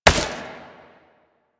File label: Step Dirt, Footsteps